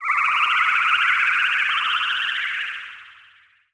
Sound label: Science Fiction Sci-Fi Electronic Vintage Alien Ship